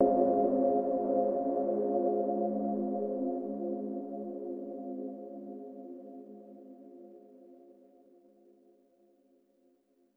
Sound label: Overlay Tone; The Shard